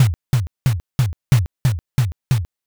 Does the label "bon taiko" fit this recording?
Yes